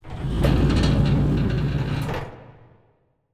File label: Metal Door Opening